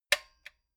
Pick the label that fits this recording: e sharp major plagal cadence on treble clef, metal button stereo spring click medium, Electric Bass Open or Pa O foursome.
metal button stereo spring click medium